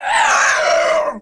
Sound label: pain